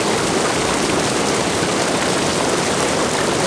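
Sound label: waterfall medium loop; water splashes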